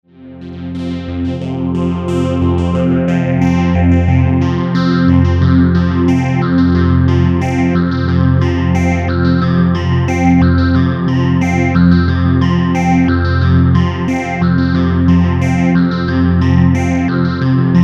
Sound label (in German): Sequenz mit synchronisierten CV; Behringer Dual Phase; via Maths